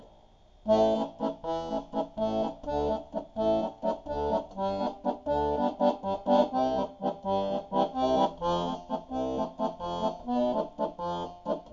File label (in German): artiste bass, akkordeon